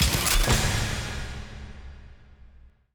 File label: store item activated; Counter Strike Model Pack; CS:GO